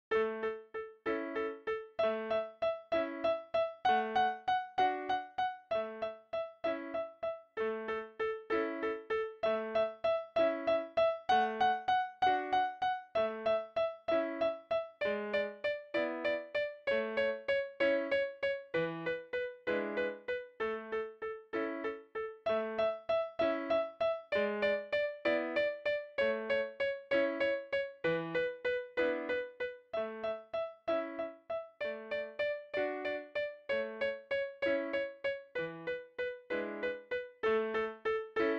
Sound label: Twinkle Var D